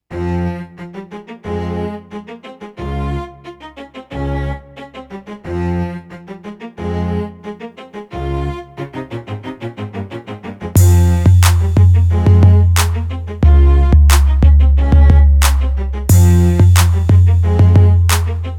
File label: like a violin, beats